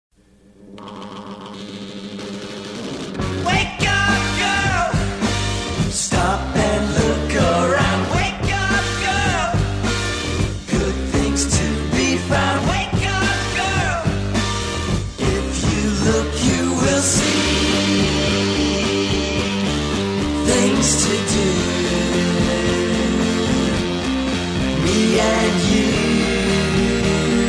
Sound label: Coca Cola Commercial